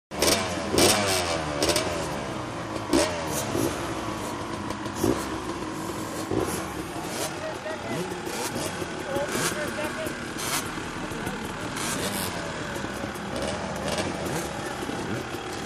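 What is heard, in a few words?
Start Ambience 250 Cc, Bikes Idling, Revving, Then The First Heat Race Away For The Start Of The Race, Followed By The Second Heat, Shot Cu From The Starting Line.; Motorcycle Motocross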